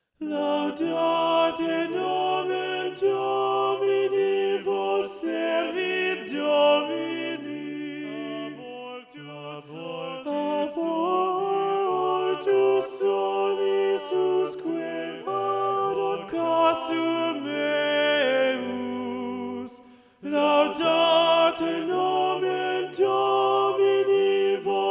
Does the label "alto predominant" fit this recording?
Yes